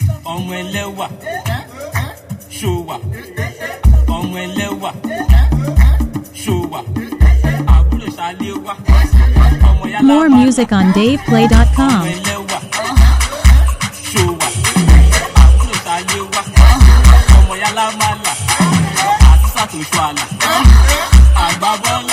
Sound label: DRUMS OMO ELEWA KUKU BEAT